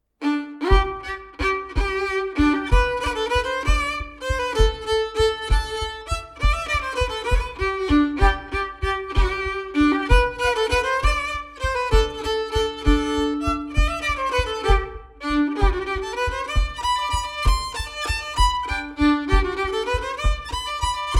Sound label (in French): Bourree d Auvergne